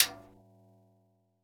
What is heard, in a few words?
Timbal Side Stick; Percussion